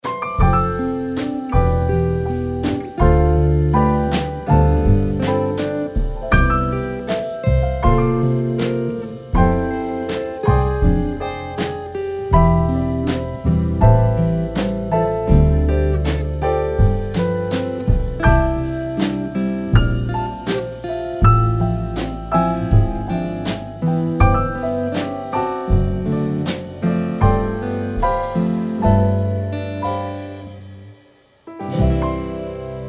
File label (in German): telefonansagen musik bar music